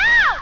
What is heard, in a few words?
OOHH